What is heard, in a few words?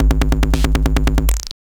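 DRUMLOOP ELECTRO 140; Techno2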